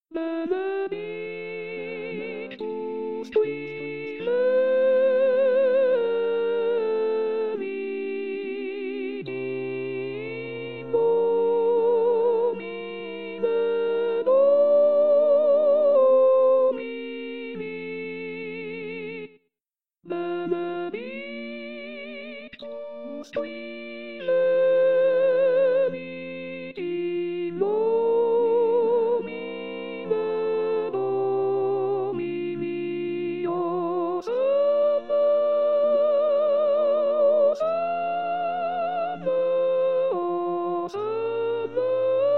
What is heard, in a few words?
Benedictus Soprani; pasqua